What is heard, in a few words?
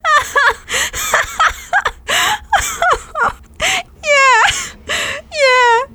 laugh long; femscout